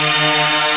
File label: jud minor